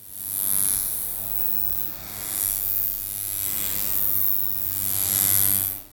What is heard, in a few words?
a swarm of multiple small, buzzing